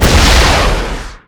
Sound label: Rocket Launcher Shot; SFX Kit; Weapons